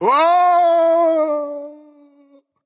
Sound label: fear; smite; vamana